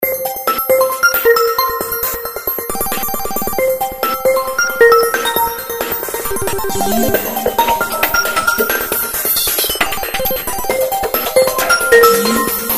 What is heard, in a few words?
Phone Space, Ringtones; Motorola; ZN40